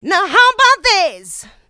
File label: Female Vocals; Houseworx